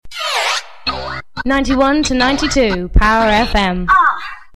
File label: female aahh